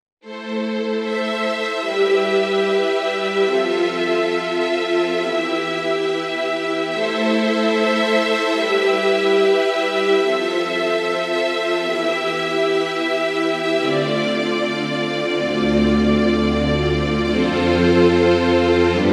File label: Vivo S1 Strings